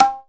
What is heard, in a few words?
ROLAND SPD-20 PERC TABLA